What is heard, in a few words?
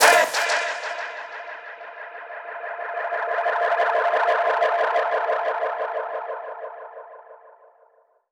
FX Impact; Mutekki Media; Vengeance Electroshock Vol.2